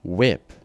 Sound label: whip